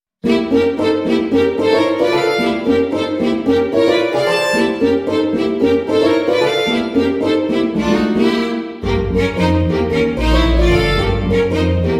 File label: Violin; Undertale